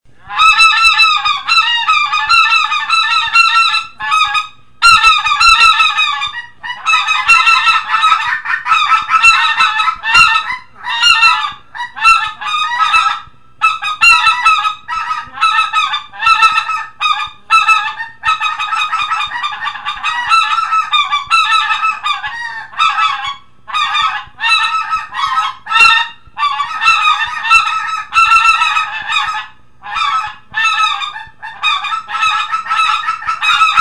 Speckle Belly Goose

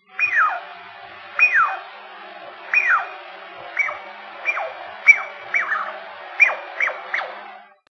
Squirrel Chattering Chickaree; ORION

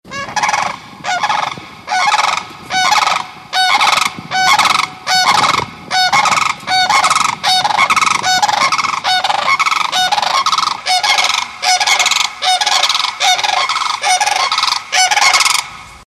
Sand hill crane flying; ORION; Birds

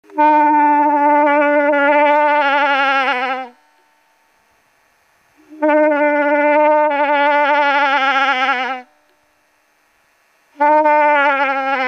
Old Cow In Heat Locator Call, Moose

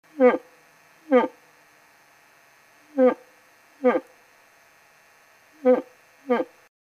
Old Bull Challenge Call, Moose

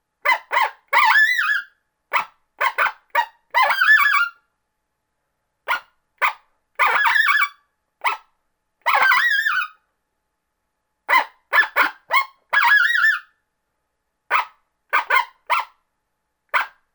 Coyote Challenge Bark

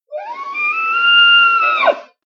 Elk Bugle; ORION

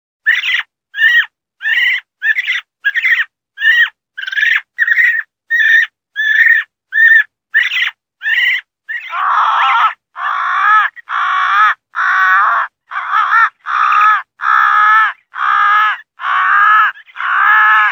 Owl crow fight

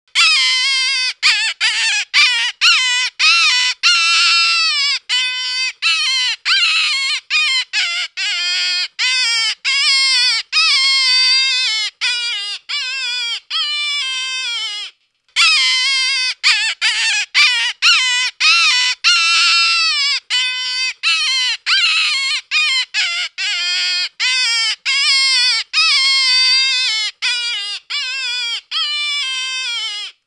Jackrabbit

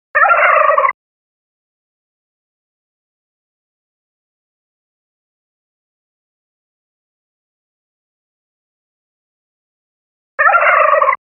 Gobble, Turkey

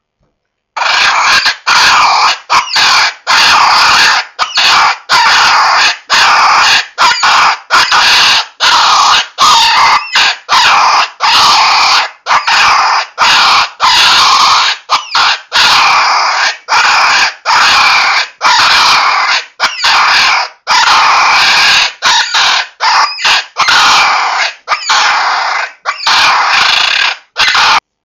Raccoon Squall; Mity Max

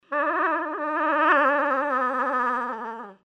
Cow In Heat Short, Moose

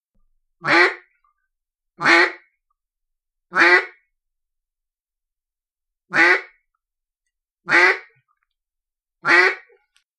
Mallard Duck Lonesome Hen, Duck; ORION

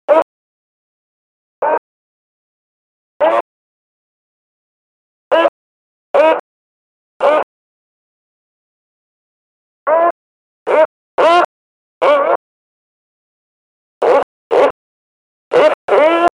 Beaver

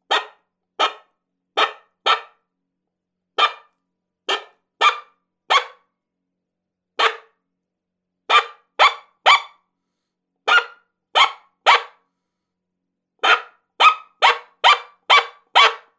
Coyote Single Barking; Dave Kelso

